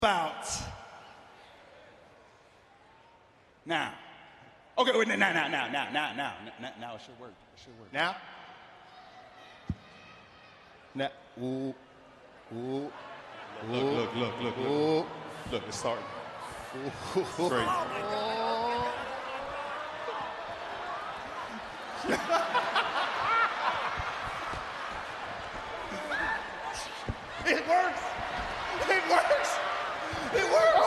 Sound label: hot crowd reaction